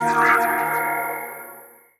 Hit

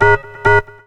Stab; Blu Mar Ten; Jungle Jungle - 1989 to 1999 Samplepack

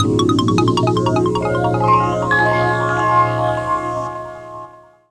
Rhodes, 3A; Jungle; Riffs, Arps & Hits; Blu Mar Ten - Jungle Jungle - 1989 to 1999 Samplepack

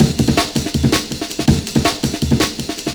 Amen; Jungle; Breaks; Blu Mar Ten - Jungle Jungle - 1989 to 1999 Samplepack

